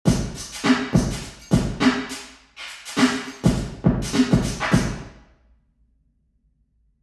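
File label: council chamber impulse response drum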